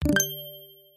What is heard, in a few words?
message received